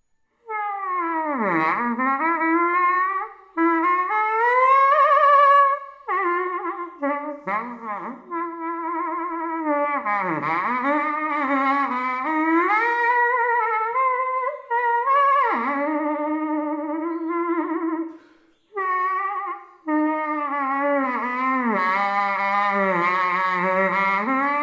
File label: SAX TOOTER